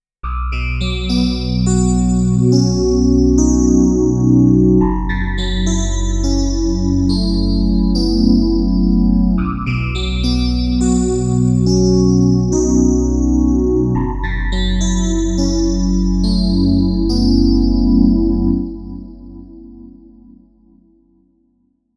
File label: Crystal EP, KORG Node